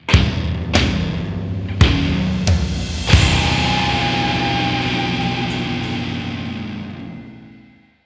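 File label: Multiplayer Match Lose; modern combat 3 fallen nation; android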